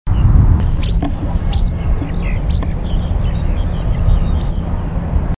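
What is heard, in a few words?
yellow wagtail voice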